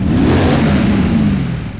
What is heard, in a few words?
rev, jetpack; quake2